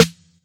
Snares

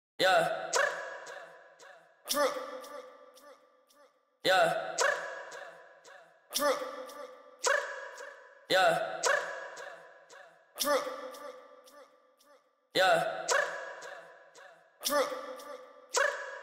112,900bpm Voxloop

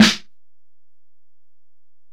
Snare

